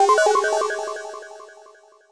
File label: vanish; Barotrauma; More Level Content